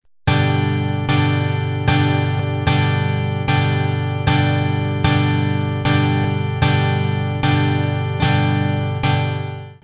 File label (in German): g dur 4f akkord